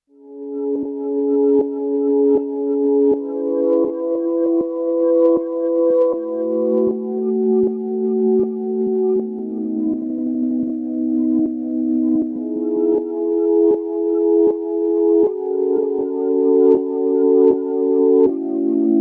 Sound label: Bell Sound; Sonicware Texture Lab